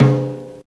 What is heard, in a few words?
JAZZ TOM, JAZZ DRUMSET